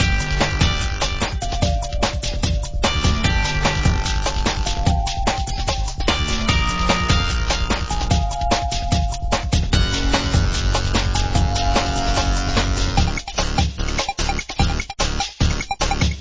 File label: Music Background; Ben 10 Battle Ready